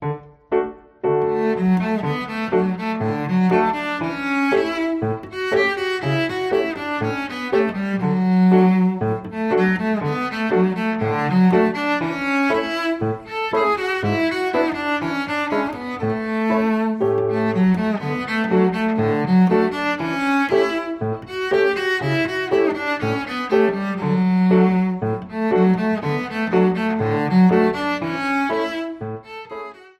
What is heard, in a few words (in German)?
gavotte